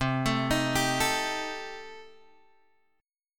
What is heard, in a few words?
C Minor 6th C Guitar Drop D